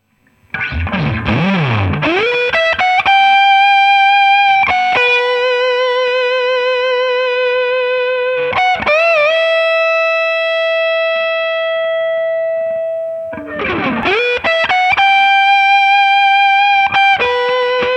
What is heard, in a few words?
Express Crunch